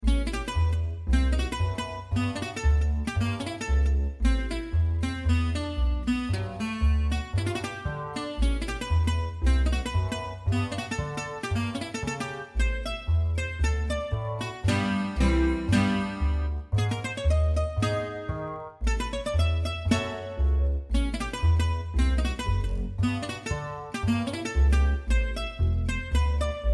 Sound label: Jazz django; guitar; django jazz library